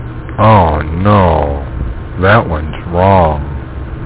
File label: incorrec